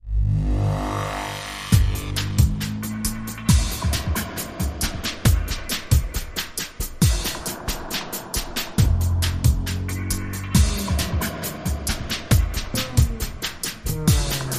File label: Ambient